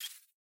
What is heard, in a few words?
click; fight banter